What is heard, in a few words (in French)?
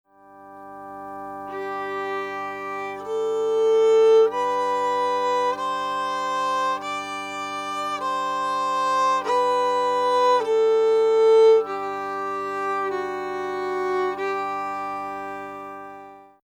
echelle